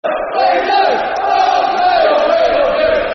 We Love You Vale [Football Chant (Soccer Songs)], Port Vale; Port Vale Pvfc